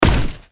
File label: foot; blackknight